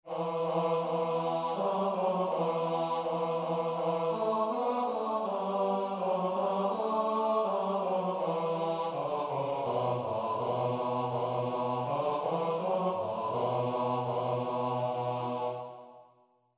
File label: bass